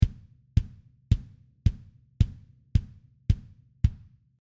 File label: drum tite